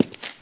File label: 146 broken kick click, drum loops and breaks; 141 to 160 bpm